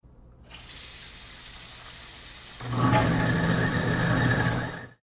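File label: water and garbage disposal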